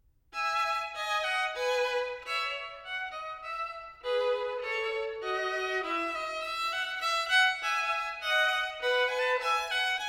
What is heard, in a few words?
Partita for solo violin No. 3 in E major, Menuet I; BWV 1006